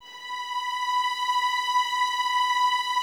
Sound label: violins B 5, String Ensembles, 23 Violins; Miroslav Vitous